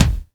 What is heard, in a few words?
AX MIX BD; AKAI S6000 CD-ROM - Volume 1; DRUM RYTHM